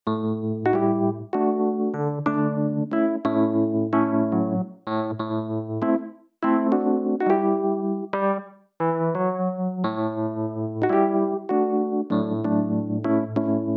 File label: WURLY